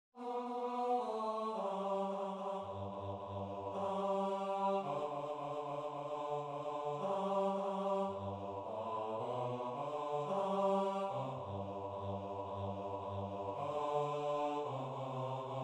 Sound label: DOWN TO THE RIVER TO PRAY BASSE